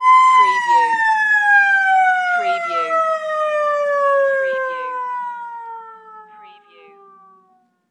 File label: Police Siren End